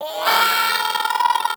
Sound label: Vocal Death; Hyena Micro